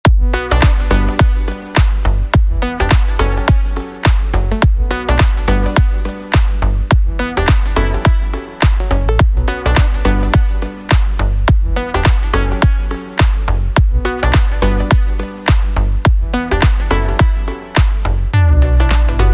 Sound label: Beat